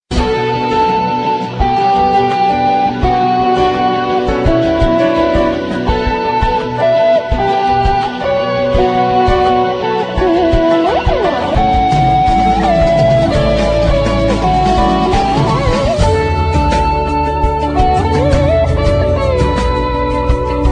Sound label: Instrumental